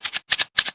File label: salt